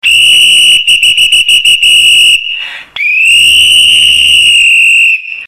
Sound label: thunderer 60.5, silver plated whistle; acme thunderer 60.5